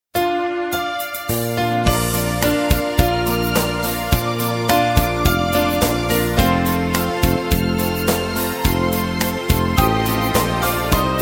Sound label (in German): drei töne am klavier